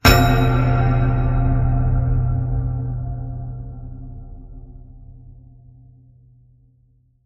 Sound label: Low Bell Strike